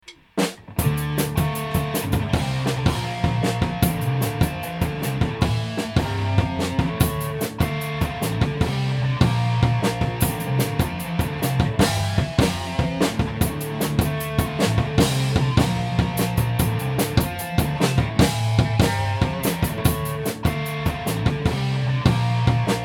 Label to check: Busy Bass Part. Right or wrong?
Right